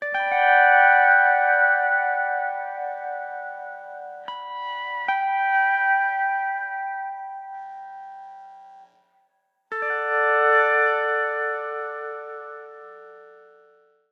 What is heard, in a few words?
Steel